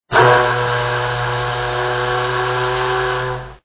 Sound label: factory whistle